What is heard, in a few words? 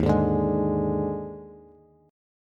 Eb Major 7th Suspended 4th, Bass; strummed; chord; JGuitar